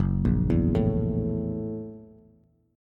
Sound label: F sharp 7th Sharp 9th, down, Bass; arpeggiated; JGuitar